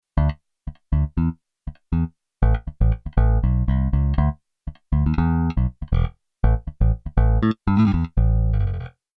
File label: bass funk bass